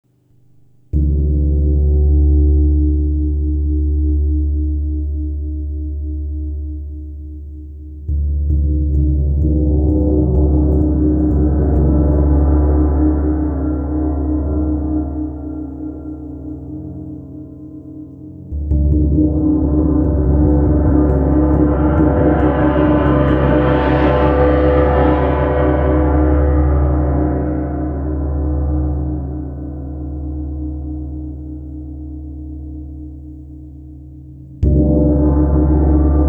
Gong Tibet Original

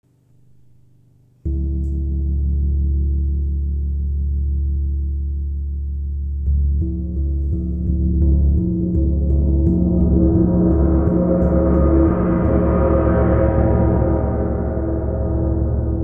Gong Tibet; Vorderseite; Sonne

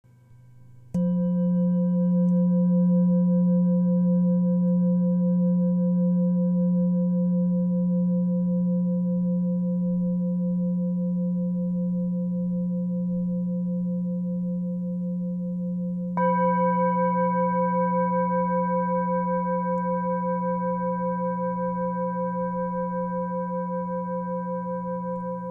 Aum Mantra Klangprobe

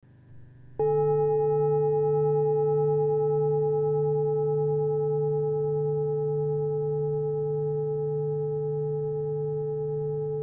Mond 1497Hz Empfehlung

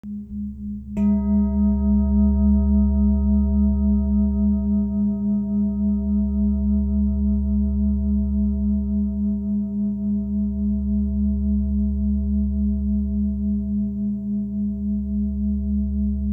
Klangschale Om Fuesse C 696Hz; Klangprobe

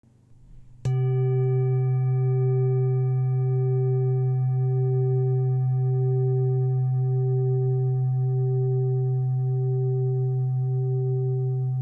Mondschale Langer klang

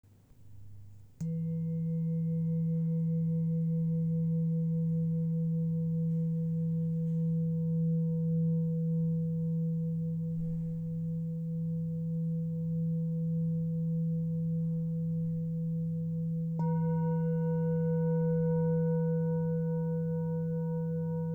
Single Dorje

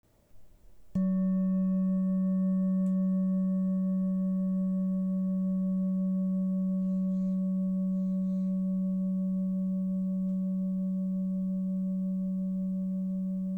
Lingam

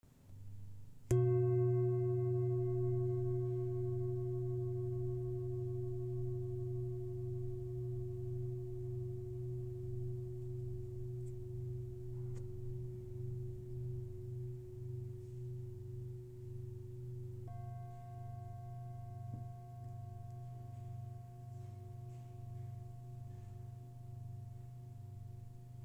Muschel

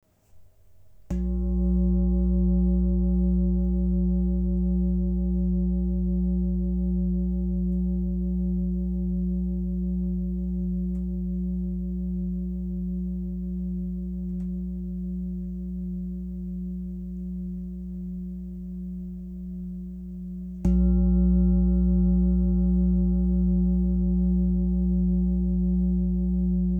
Fussklangschale

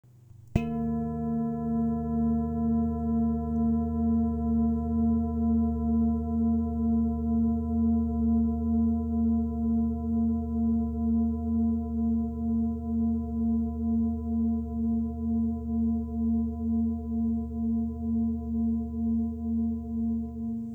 Fussklangschale 66kg 405cm; Lebensblume

